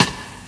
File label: snare f sharp key; single hits one shots